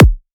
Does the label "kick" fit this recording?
Yes